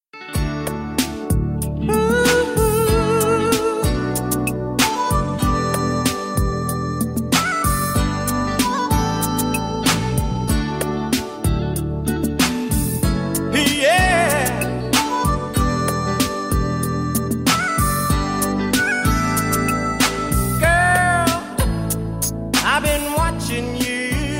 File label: yamaha dx7